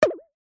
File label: cartoon ping twang; zapsplat